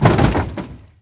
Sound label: SWIPE, Prangs